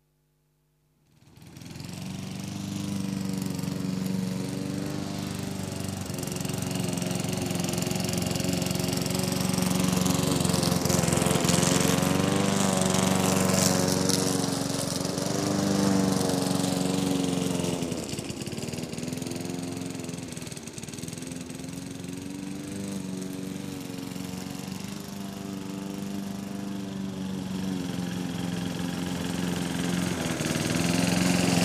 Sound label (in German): Rasenmaeher Benzin; Haushalt